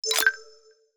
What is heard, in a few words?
Item Pickup; Opsive; UltimateCharacterController